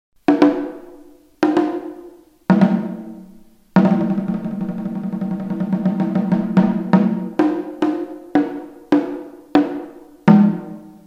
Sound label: oddaiko